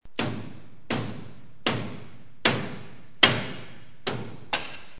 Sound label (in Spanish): Mazo golpeando metal